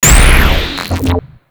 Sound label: Null Star Dissolve; Warframe; Warframes; Antimatter